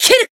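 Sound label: Voice attack, Japanese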